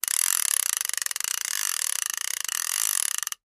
Fishing reel being reeled in at medium and fast speeds, close up.; Reel, Fishing Click, Fishing Reel Ratchet, Fishing Reel